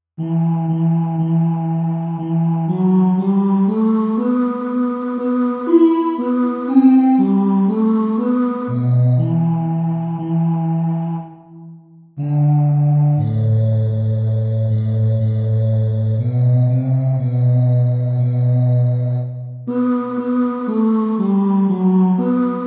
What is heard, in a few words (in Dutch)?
paternoster stem bas